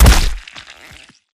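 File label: Stalker Movement Synced Melee Chestpunch Impact; Titanfall 2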